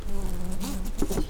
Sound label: Lapidarius queens, bumblebees